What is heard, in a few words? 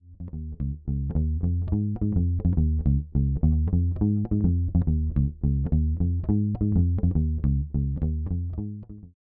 Fender Tonerhammer Drive 0 EQ flat